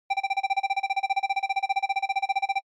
SNES Fighting Score

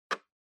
Clock Minute Hand Dry

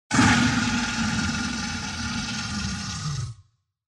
Monster

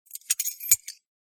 Padlock key

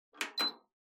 Kerosene Fan Heater Button

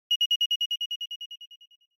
Crosswalk Signal

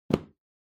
Sensu Put Cushion

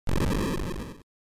General Noise Single

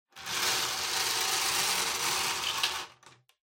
Bingo Machine Spin Drop

